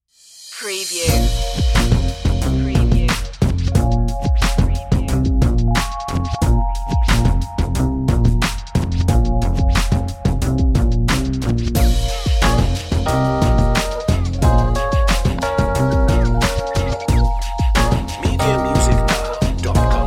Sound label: hip hop